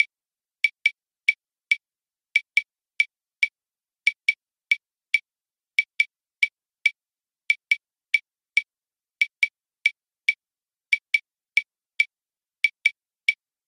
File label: habanera